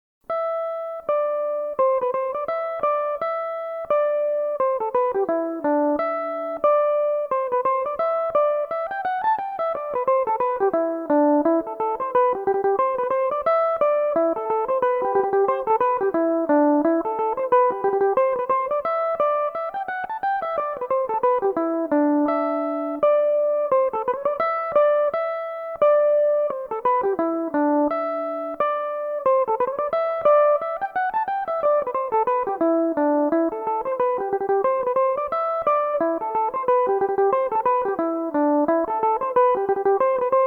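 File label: A dorian, irish mandolin, tunes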